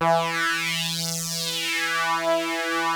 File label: KORG, Rare Synthesizer; Hollywood Edge - Giorgio Moroder Rare Synthesizer Collection